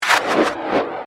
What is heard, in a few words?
Space Pneumatic, Scifi, Space, Pneumatic, Burst, Science, Fiction